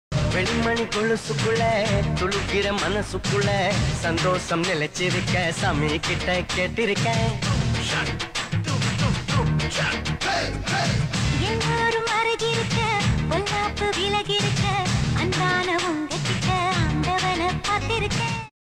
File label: motivational song; ghilli re-release